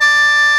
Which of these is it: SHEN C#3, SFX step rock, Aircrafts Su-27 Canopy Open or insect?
SHEN C#3